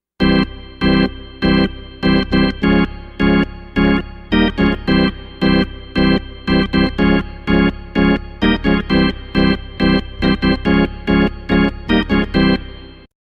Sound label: Build Up